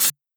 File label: Hihat Closed